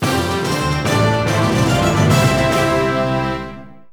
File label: Bell of Victory; Chiptune; Video Game; Nintendo DS; Final Fantasy Tactics A2 - The Sealed Grimoire